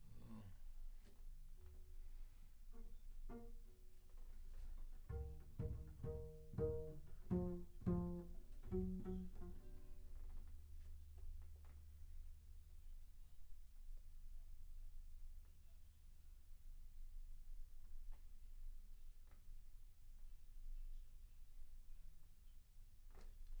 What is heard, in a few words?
Bass Room